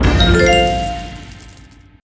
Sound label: effect jackpot start